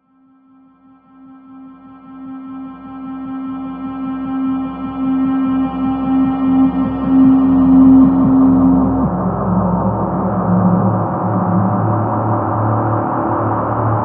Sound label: octave, guitars; Big Fish Audio - Alien Guitars; HOLY OCTAVE